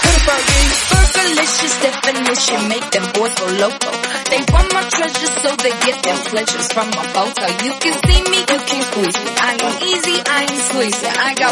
135bpm B

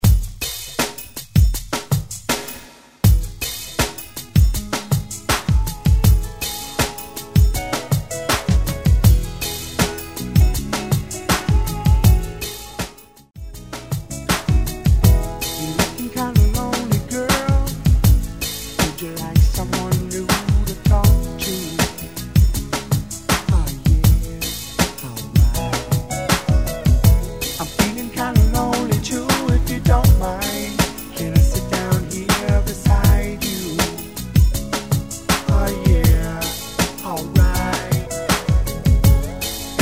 70s; Redrum

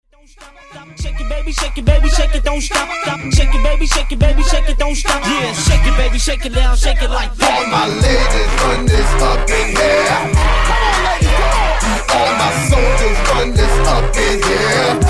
Funk EDM Segue 102 128Bpm

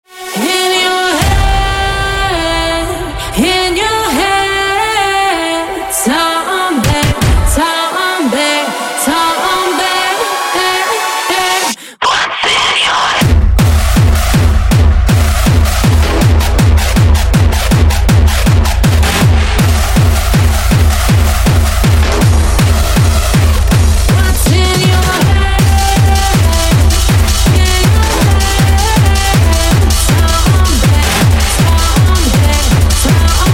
HARD TECHNO EXTENDED INTRO

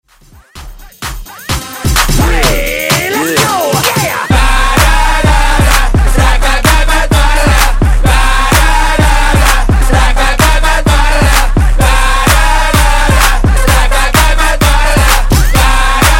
Slam Clap 128Bpm

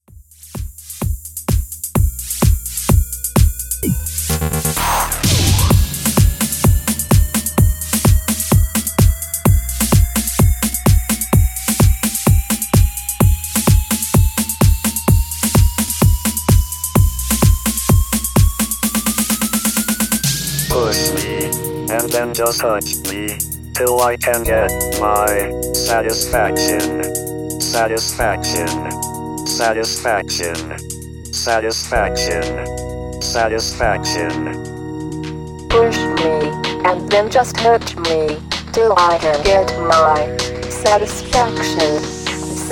Mashup 128 Bpm

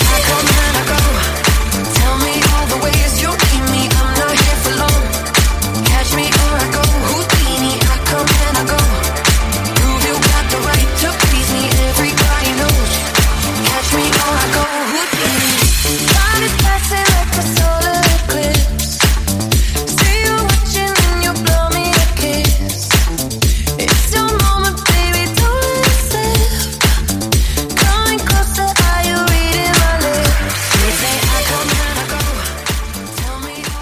123bpm Dm

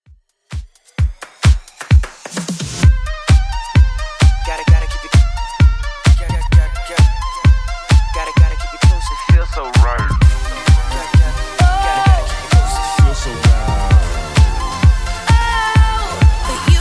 Top40 Dance Redrum 130bpm

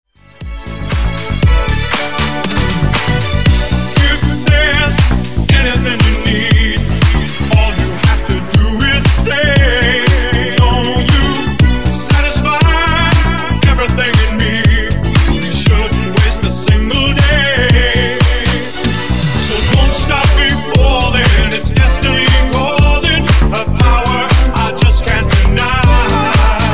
Funk Edit 118Bpm

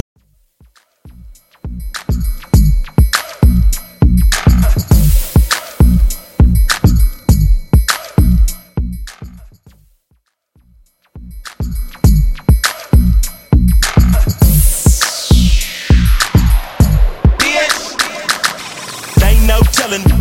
Top40 Hip Hop Extended Mix 101 Bpm; Vegas Edit

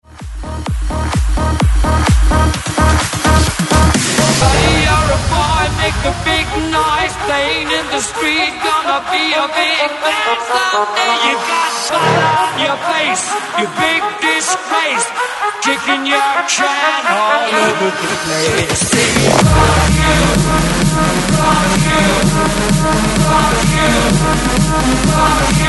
128 BREAKMIX; DJDOLLS

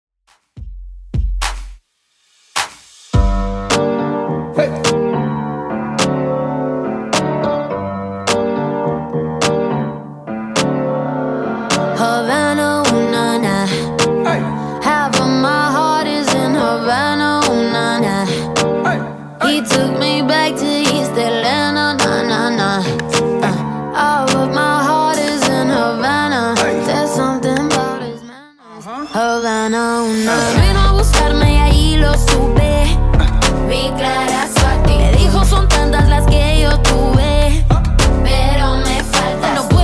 2ks Redrum 105bpm

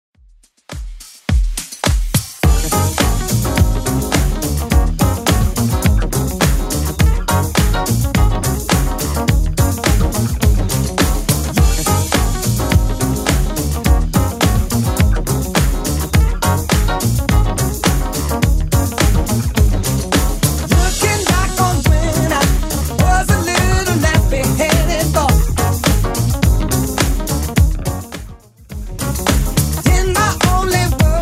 70s Club 105 bpm CLEAN

